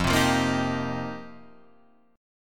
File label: F 11th Suspended 2nd; F112 Chord {1 3 3 3 4 3} strummed; 112:1,2,(5),b7,(9),11